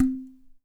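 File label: KAE KEEKE, HAWAII PERC; AKAI S6000 CD-ROM; WorldSounds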